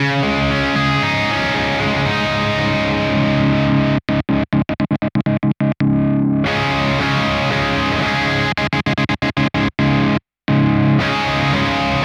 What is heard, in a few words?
Cort MBM 1 Peavey killswitch